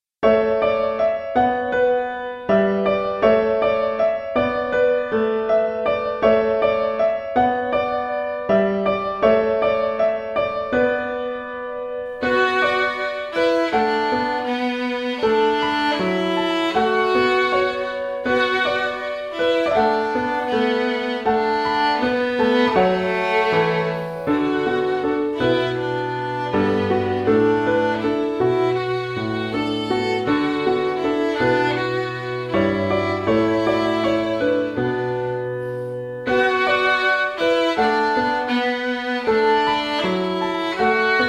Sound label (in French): Violon et Piano